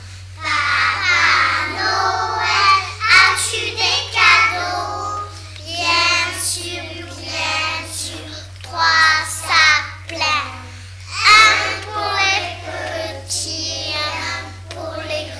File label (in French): chant; Papa Noël as-tu des cadeaux ?